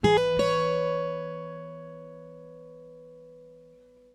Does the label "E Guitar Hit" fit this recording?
Yes